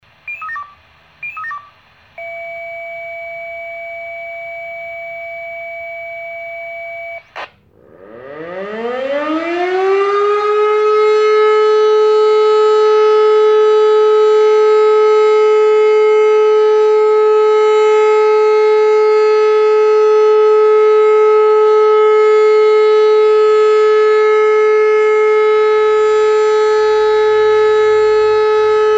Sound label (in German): sirenenwarnung